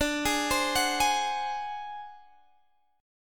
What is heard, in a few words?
D 7th Flat 5th D down Guitar Standard; D7b5 arpeggiated; JGuitar